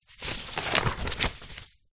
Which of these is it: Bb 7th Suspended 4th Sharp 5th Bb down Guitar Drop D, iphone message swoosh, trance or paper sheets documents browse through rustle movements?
paper sheets documents browse through rustle movements